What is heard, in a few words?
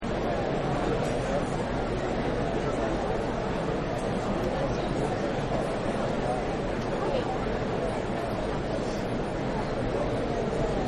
Arena Ambience, crowd; Arena Ambience 2; Crowd Sound Effects